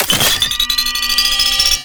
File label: Heavy Orbital Strike Cast Charge Up; Abilities; Warframe; Tau; Dax Heavy